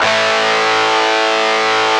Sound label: GTR FUZZ, Metal; Power Chds; Club-50 - Foundations